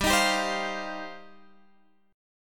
G 7th Suspended 4th Sharp 5th, G, chord; strummed; 15,18,17,17,18,x

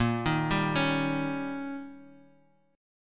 D sharp 7th A sharp, guitar

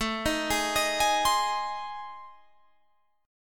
A Minor Major 7th Flat 5th, Guitar Drop D

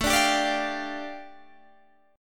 A 7th Suspended 4th Sharp 5th A, Guitar Open D

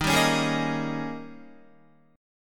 Eb 11th Eb, down, Guitar, 4F,4A,5C,5G,5C,6E; strummed; JGuitar

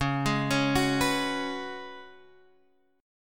Db 7th Suspended 4th Db down Guitar Open G

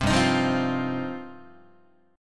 G 7th Suspended 4th Sharp 5th, Guitar; G7sus4#5 strummed

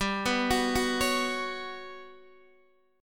G Major Sharp 11th, Guitar, Open D; chordshape; down

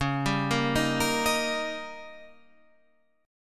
D sharp Major C sharp, chord; D#/C# arpeggiated; JGuitar

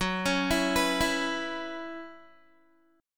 Gb 7th Suspended 4th Gb down Guitar, chord

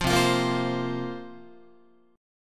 C 7th Suspended 4th, Guitar, chord

